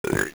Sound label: burp